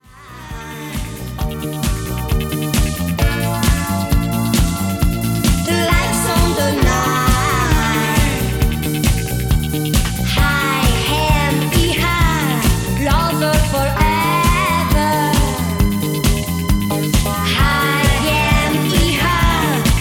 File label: drums